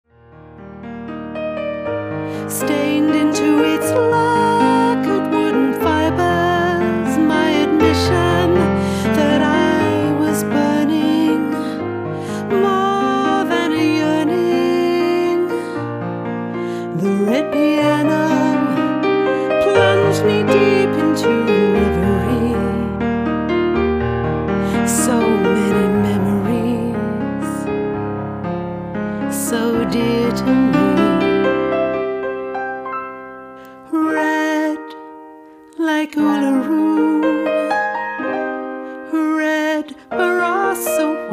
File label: piano